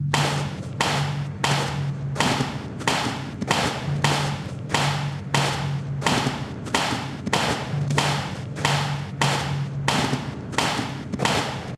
snow walk; Gachitale

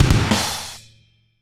rimshot; Gachitale

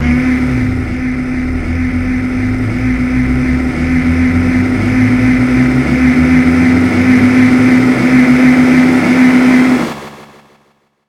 charge; hypergoner; Gachitale

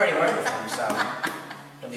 laugh; Gachitale

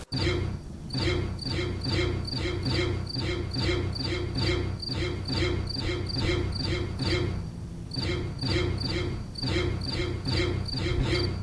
crickets; Gachitale

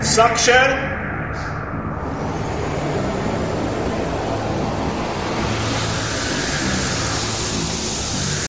wind; Gachitale

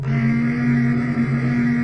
tone; Gachitale

